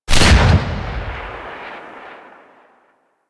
Glass Shotgun Shot C; Warframe; Tenno; Weapons

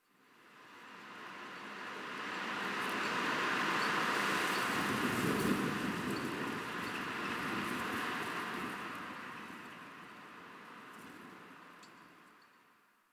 Exterior Winter Wind One Off; Ambience; Warframe; NineteenNinetyNine

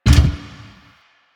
Motorcycle Gear Shift Down; Motorcycle Ninety Nine

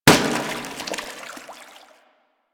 Water Balloon Impact; Warframe; Water Balloons

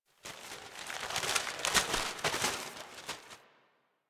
Paper Blowing E; Warframe; Ambience; Grineer; Carnival

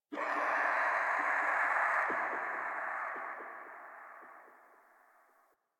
Chatter Painful Scream; Ambience; Misc Outdoor; Warframe; Infested Eris

